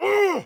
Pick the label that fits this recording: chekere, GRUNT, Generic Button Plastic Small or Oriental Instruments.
GRUNT